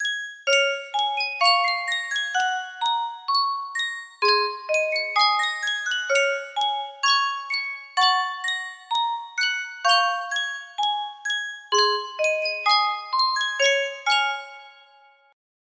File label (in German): Sankyo Spieluhr